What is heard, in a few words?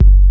SUB BASS; E-MU Formula 4000 Series Vol. 1; Hip Hop Nation; Big Bottoms